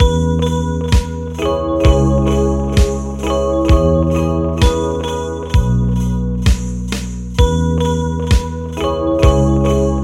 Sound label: Chill Ringtone